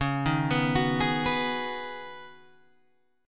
Db 7th Suspended 2nd Suspended 4th Db down Guitar Open G; Db7sus2sus4 arpeggiated